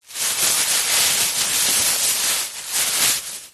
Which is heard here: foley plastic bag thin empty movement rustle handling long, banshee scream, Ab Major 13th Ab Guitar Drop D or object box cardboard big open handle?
foley plastic bag thin empty movement rustle handling long